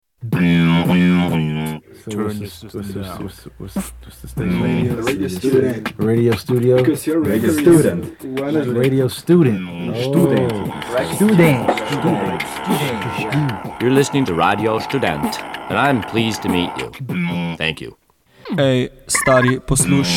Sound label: HIP-HOP